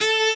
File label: cello